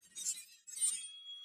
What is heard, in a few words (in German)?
Kasse Tone Sms; Klingelton